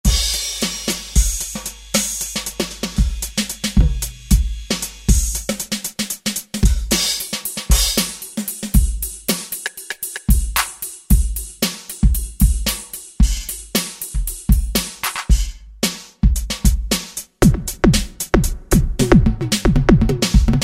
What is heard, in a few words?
Guess Drums, Alesis QS8; Factory Preset examples